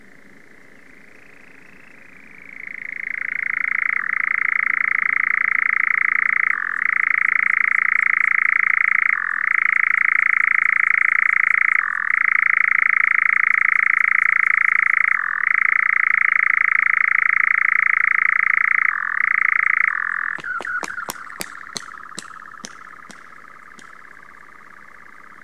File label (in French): Engoulevent d'Europe Caprimulgus europaeus; Xngoulevent d'Europe; carnet nature ete